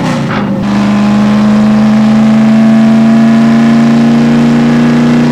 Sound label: junker, vehicles